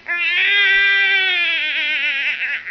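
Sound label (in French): Pleurs Bébé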